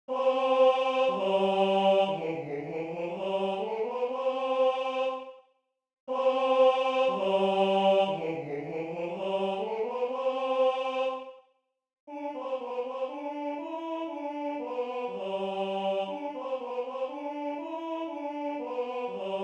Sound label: Tenor I; Bacchus